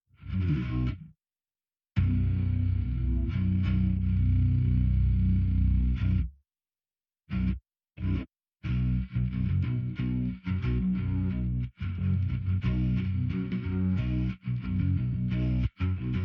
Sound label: Versão Piseiro, bass